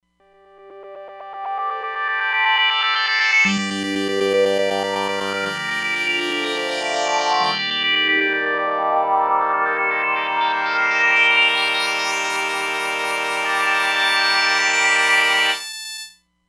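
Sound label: Panning Formant, Roland Fantom XR; PanningFrmnt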